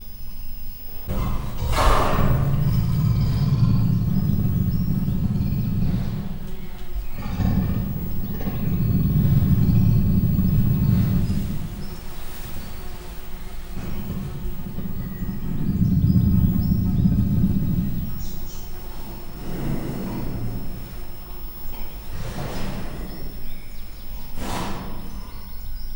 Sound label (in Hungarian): itt vagyok, perzsa leopárd; miskolci zoo 2018